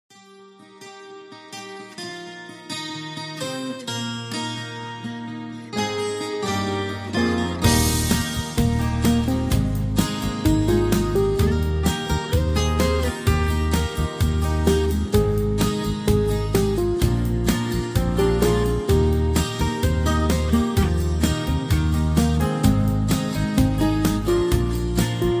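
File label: Instrumental with Melody No background vocals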